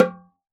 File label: OXACA DRUM; Synclavier Percussion; World & Orchestral; ILIO